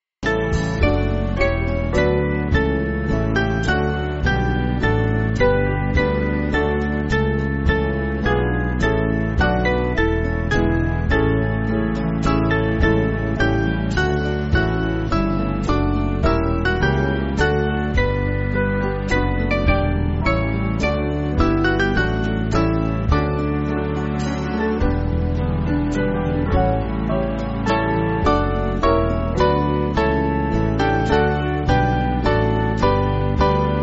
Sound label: Band, church music; When All Your Mercies; Harington